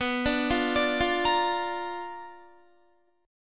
B Minor Major 7th Flat 5th Guitar; arpeggiated; JGuitar

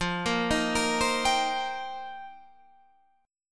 F 9th Suspended 4th, chord

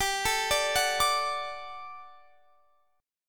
G Major 7th Suspended 2nd G down Guitar Standard; arpeggiated; capo 2

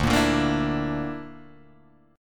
D Suspended 2nd Sharp 5th D, Guitar, Open G

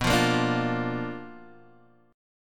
B Minor Major 7th Flat 5th B, Guitar, Open D

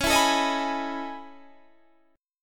A Major Sharp 11th, C sharp

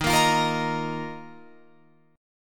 Eb Suspended 2nd, Guitar Open F; Ebsus2; strummed

